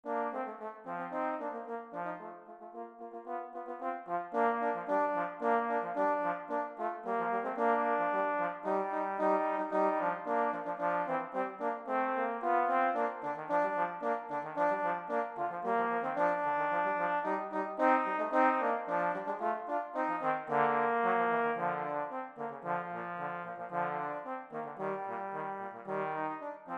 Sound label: jingle bells